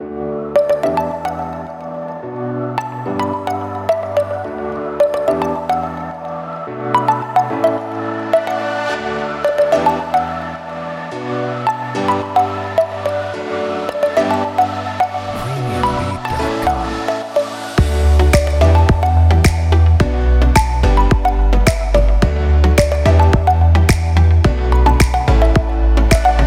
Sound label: bright lifestyle